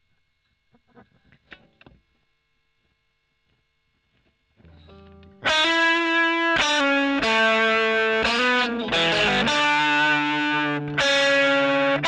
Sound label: E.GTR OD; Gefell